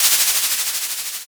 pen

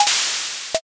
syringe

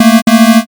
wrong

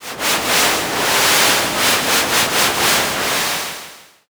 river

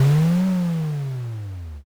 dinosaur roar

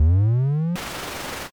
machine start

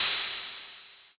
cymbal

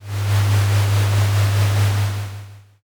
conveyor

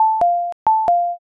cuckoo

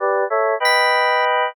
choir

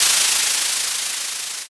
tire skid

